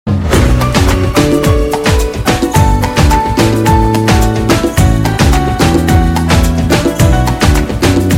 instrumental; Indian; Ek Tha Tiger

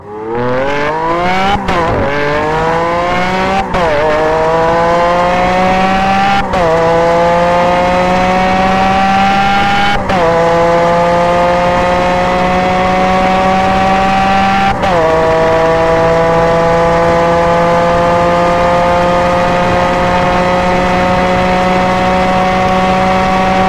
Lamborghini Aventador

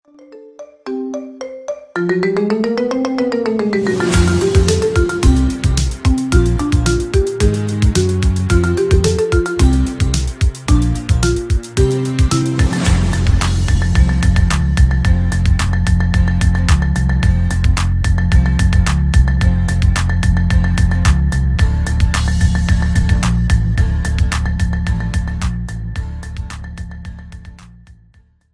marimba ringtone remix; Lazy Town

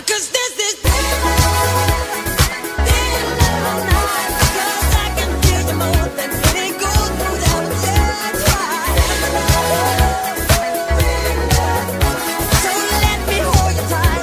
thriller

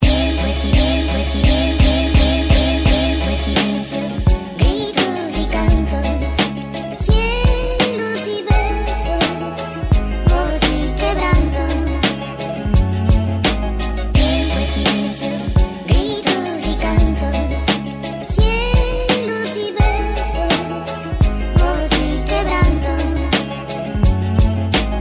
Latin romantic music

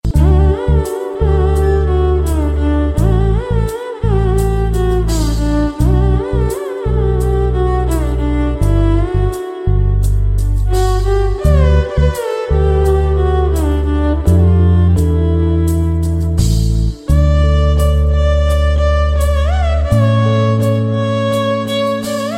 tamil instrumental; Indian

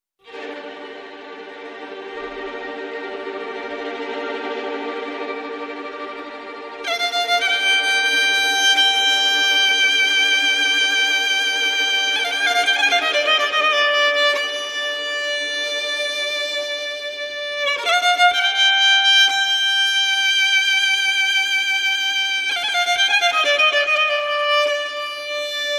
romanian violin; Electronica

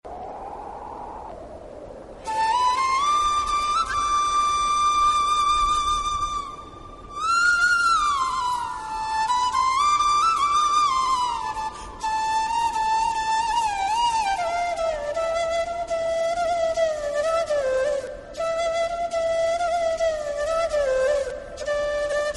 flute ringtone; Kailasanathan; Religious

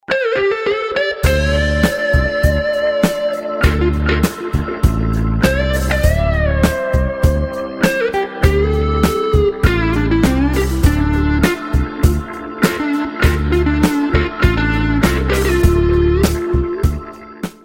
jazz melody

